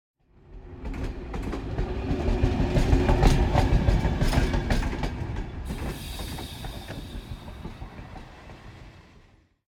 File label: Train Short Low Speed Passing European Train Type - L to R; Hollywood Edge; sound designer's toolkit v2